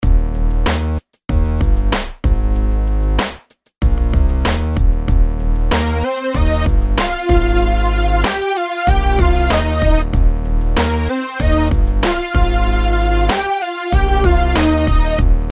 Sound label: melodies simple Ebmaj; melody